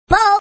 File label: bowl